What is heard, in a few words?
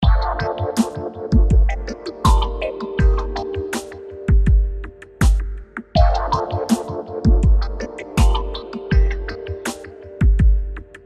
instrumental mix Electronica